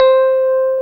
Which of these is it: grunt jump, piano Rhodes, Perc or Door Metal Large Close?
piano Rhodes